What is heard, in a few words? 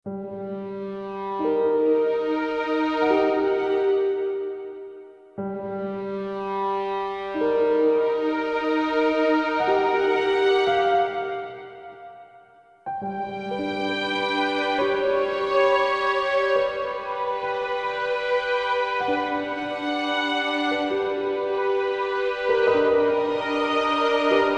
amb piano